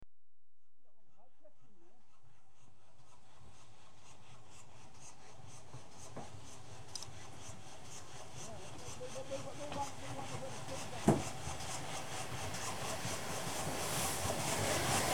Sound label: Train Arrives Left Doors Open; BBC Sound Effects Library; Age Of Steam